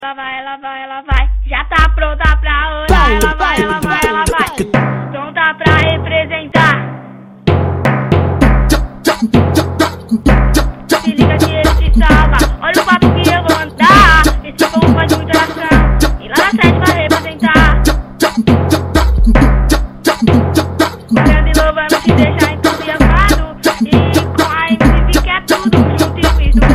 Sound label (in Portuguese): gospel funk